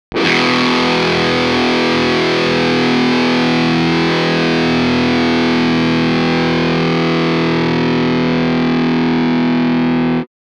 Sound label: SYNTHETIC E5 chord WITH distortion, electric guitar; electric guitar synthesis